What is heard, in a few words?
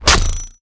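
Throw Shell, Damage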